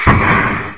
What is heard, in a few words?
air turret fire, vehicles; hl2